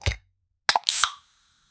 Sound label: can of soda opening and